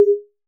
Button

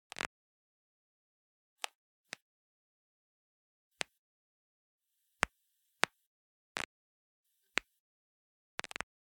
Cracking Knuckles

